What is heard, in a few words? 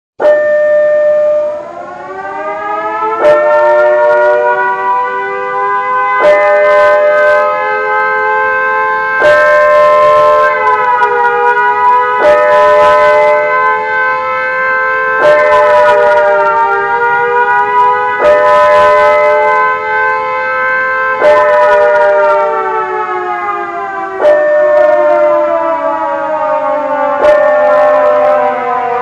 Nuke Alarm